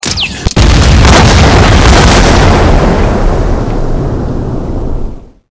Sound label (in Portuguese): explosao